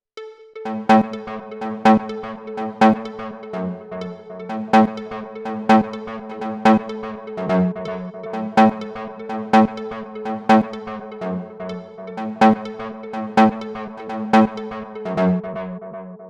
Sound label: Melody dTape Delay; Timeline